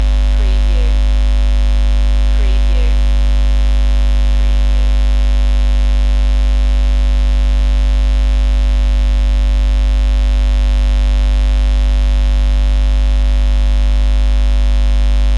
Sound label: Electric Hum Static